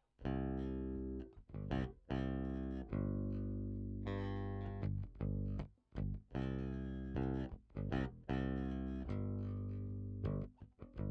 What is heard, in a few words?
Bass